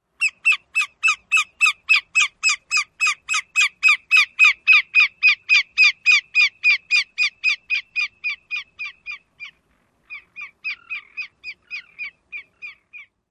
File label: more calls